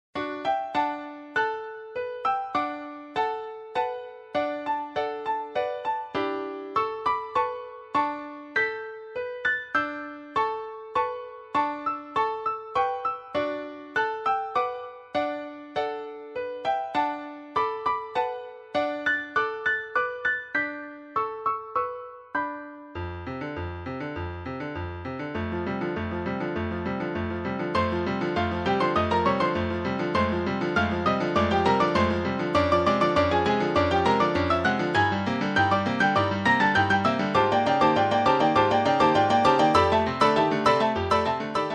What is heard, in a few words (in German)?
Klavier zu 4 Händen